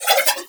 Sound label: Nunchaku Prime Spin Whoosh; Weapons; Warframe; Tenno